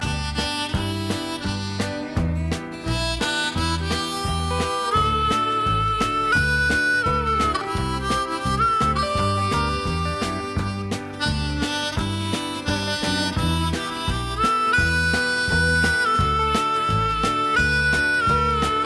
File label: Harmonica Playing